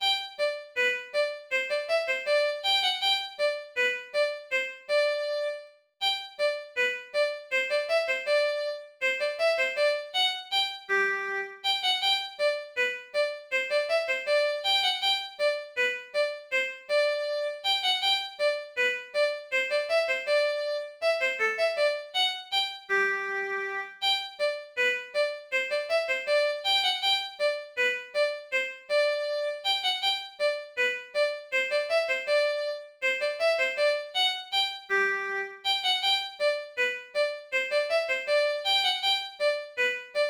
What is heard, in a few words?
Fiddle Game Tune